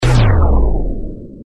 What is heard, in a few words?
enter, bullet time; mb bullettime